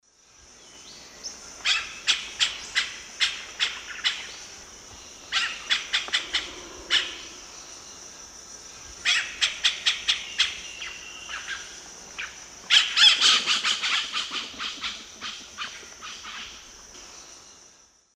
Cacicus cela, calls and flight calls pair; S. America; Eastern Lowlands

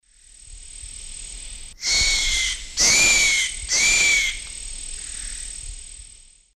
Piculus chrysochloros, incomplete call; S. America; Eastern Lowlands

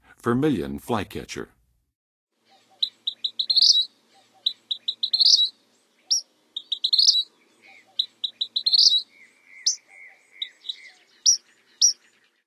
Vermilion Flycatcher, Songs of Birds; North America; Western USA; Western USA Songbirds

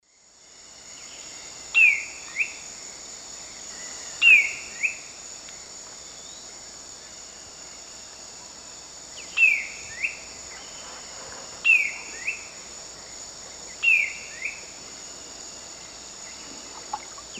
Schiffornis major, song; S. America; Eastern Lowlands

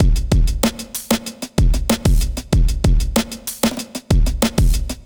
kit; Sound Effect Library

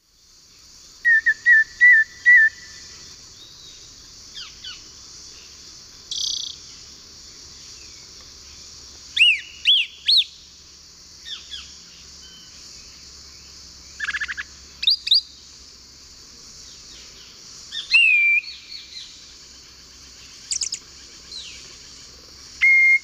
Turdus lawrencii, song; S. America; Eastern Lowlands

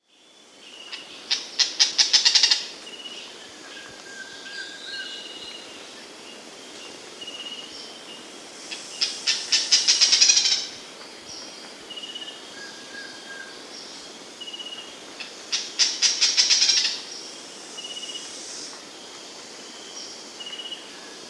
Lineated Foliage-Gleaner (Syndactyla; S. America

